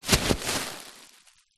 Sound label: plastic bag bin liner full of soft clothes or pillows push pressure apply; zapsplat; foley